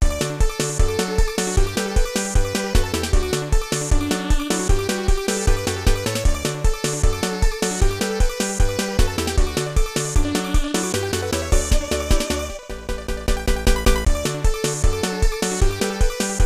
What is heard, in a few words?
Victory!; bomberman b daman; snes